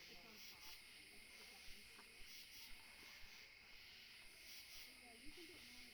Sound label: scream